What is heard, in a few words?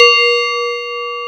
MOOG BELL, SYNTH; AKAI S-Series CD-ROM Sound Library VOL-2